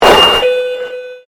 Convolution Pulse

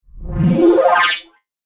Robot Sine Bubble Up Scifi Future Interface

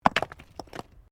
STONES MOVE

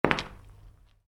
Soccer Boots: Individual Footstep On Concrete; Footstep Sound Effects; Footsteps (Sound Effects:Human); football boot; Stuart Duffield

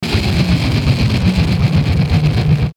Descend Distortion Sweeper Warble; Descending Sound Effects; Blastwave

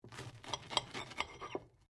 TOAST CUT; Various food preparation; Food (Sound Effects:Household)

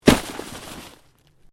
Body Movement Snow Drop; Body movement foley to simulate falls and deaths; Drops; Objects

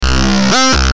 Logo Crunchy Bass, Logo Tone Warble; Sonic Logo; Blastwave

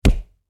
Impact Hit Solid; Punch And Hit Sound Effects; Punches and Hits (Sound Effects:Combat); SFX Bible 6

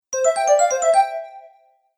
Magical Message Notification; Musical Sound Effects

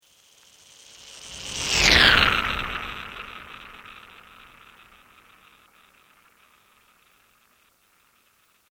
Sweep Organic Slow

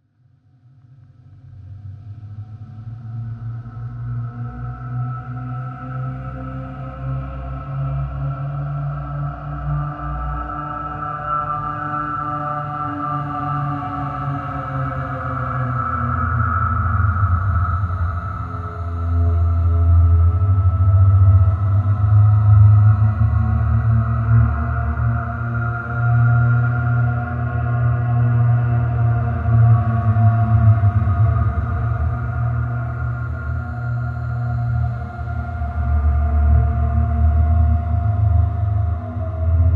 Bed Ghostly; BlastWave

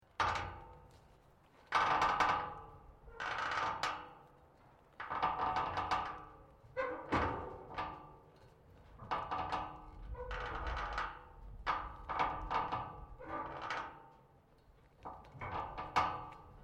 METAL SMALL SEQUENCE; Metal (Sound Effects:Materials)

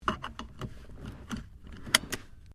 Car Toyota Corolla seatbelt clip; 2009 Toyota Corolla hatchback; Cars; Vehicles

